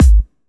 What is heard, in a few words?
Kicks; Vengeance Samples